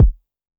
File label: Kick